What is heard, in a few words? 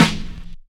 subtle reverb snare sound E key, Royality free snare tuned to the E note